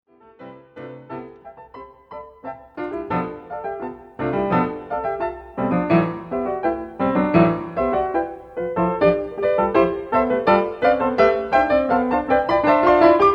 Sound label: piano sonata